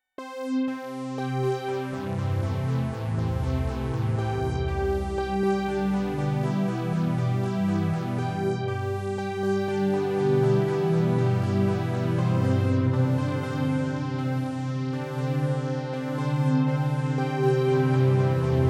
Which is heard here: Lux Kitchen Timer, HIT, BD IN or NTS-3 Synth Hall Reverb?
NTS-3 Synth Hall Reverb